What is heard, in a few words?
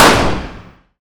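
fire distant 45acp pistol interior; gmod; arccw uc commons attachments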